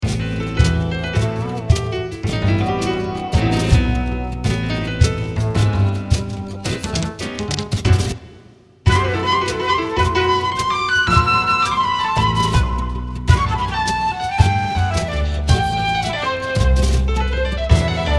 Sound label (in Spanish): veinte fandangos, flauta flamenca